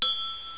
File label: CRYSTAL; 铃声素材